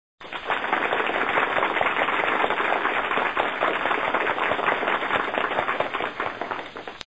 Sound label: light applause